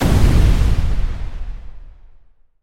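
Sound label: destructive fire after a strong explosion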